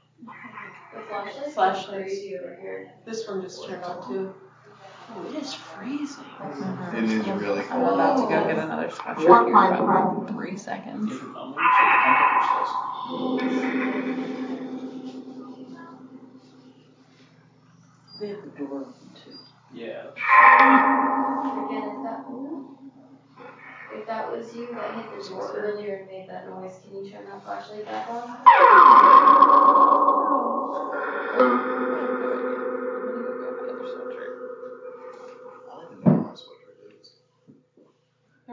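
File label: Train Station Phasma Box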